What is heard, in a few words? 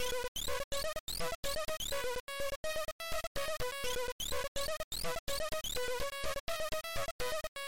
1bit music